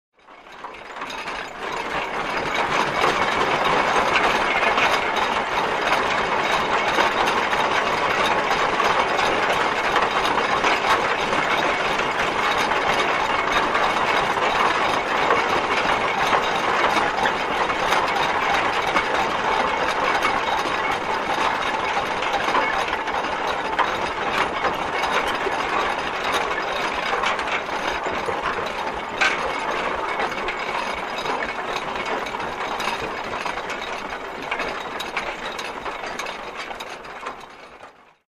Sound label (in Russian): zvuki parovogo traktora traktor edet